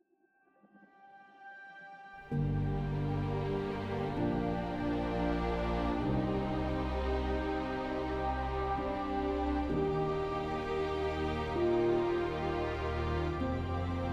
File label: string theme with atmospheres